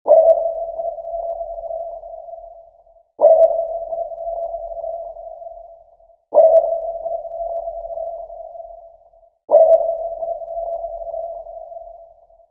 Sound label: sonar